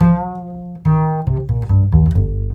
BASS LP, Drum & Bass, 140 BPM; Ueberschall; Drum & Bass Flash Trackz

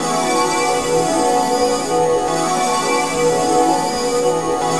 Europa Melodic, Tonal Atmospheres; Sonic Foundry (Sony Creative Software); Myths of Technology

